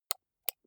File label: computer mouse right click slow